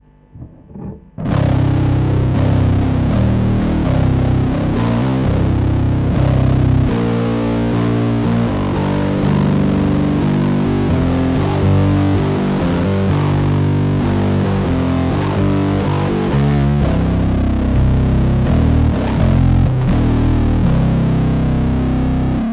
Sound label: muff BC550C; diy